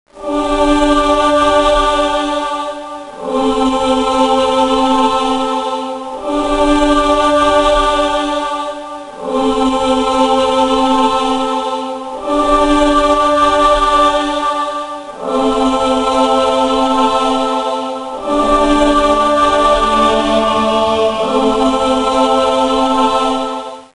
Loop

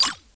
Rune payment

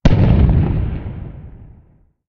Ambience

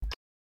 Chip Unpressed; my cute commissar 2020 gamerip

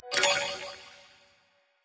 SFX UI Get Common; shuimufeifanjing

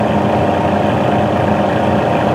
Amg C63 Low

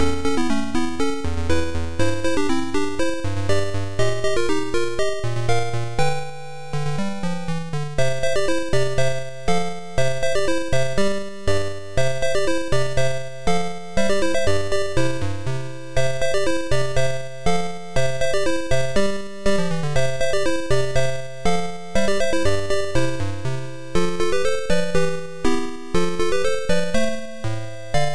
Fanfare, Apple II; ultima v warriors of destiny

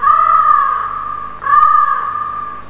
Bird

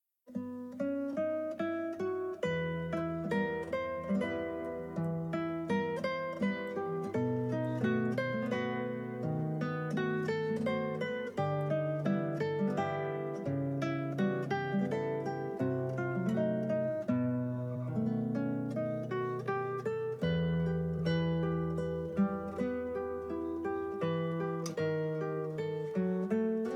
Guitar

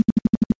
False Beast Projector; A Robot Named Fight

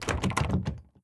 Door Lock; Devour

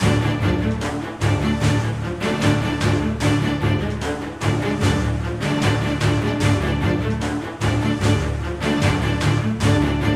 Bossfight; Q202 Ice Giant

